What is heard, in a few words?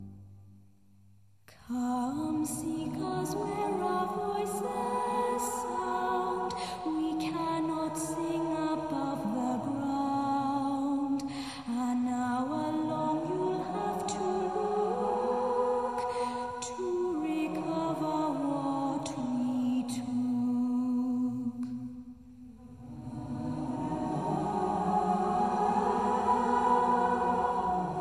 Underwater Secrets